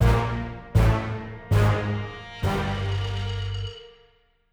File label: Casual Lose; Me and Dungeons